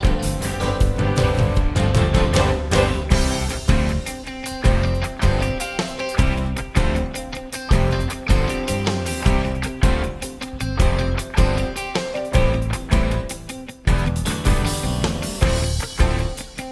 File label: Accompaniment